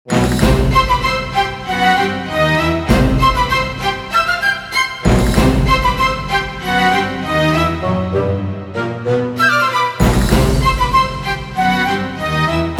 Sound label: Orchestra Playful